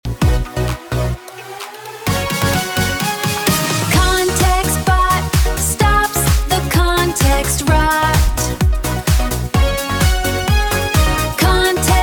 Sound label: jingle